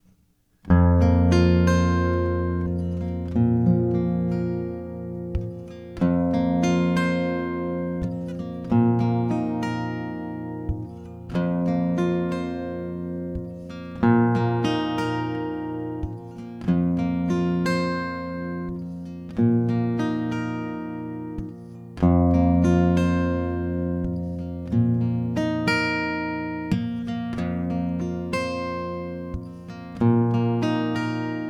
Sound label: F 90 Old Strings GTR arps dry